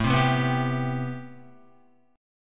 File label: A-Minor 9th A Guitar; Am9 strummed; JGuitar